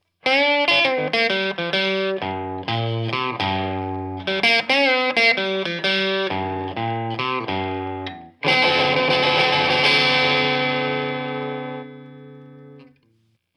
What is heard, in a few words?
tele chevalet manche